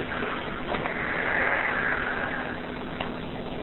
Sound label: gasp